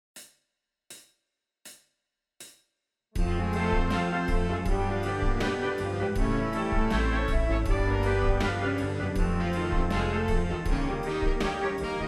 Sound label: Whole Tone Scale